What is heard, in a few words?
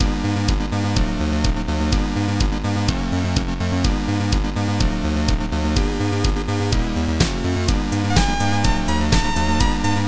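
keygen song.xm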